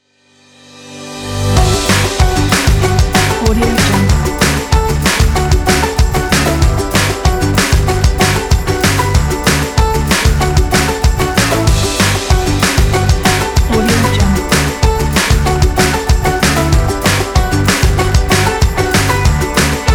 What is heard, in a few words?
happy rock; chasecreatness